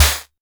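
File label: vermona drm1 mk3 snare drum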